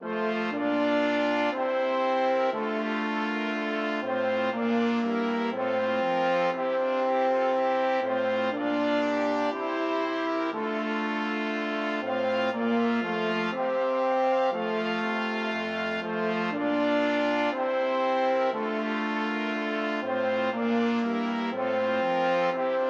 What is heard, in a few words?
March; Charpentier